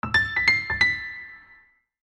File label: piano flourish